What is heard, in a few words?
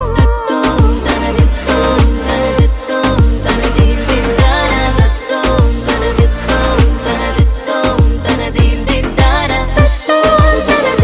Humming Bgm